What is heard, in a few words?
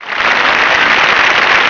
CHEER; kansei